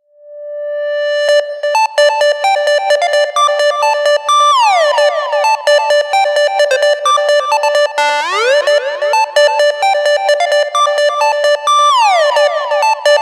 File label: PULSAR ALARME ALARM RINGTONE